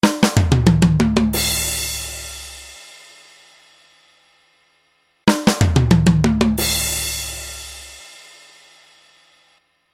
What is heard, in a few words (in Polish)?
Werbel